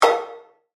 Multimedia Percussive, Technology, Multimedia, Percussive, Element; BlastWave